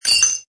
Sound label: Metal Nut jar; Metallic